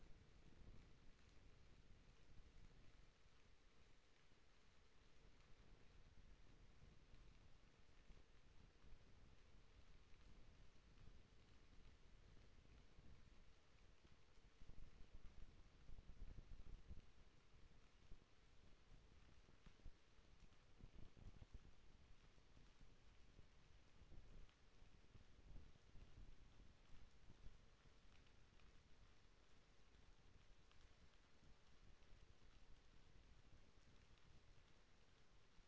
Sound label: HYDROPHONE 16000Hz; JASCO AMAR HYDROPHONE 740